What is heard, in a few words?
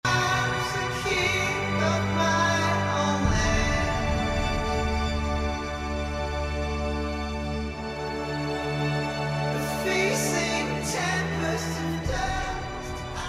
escape success; humans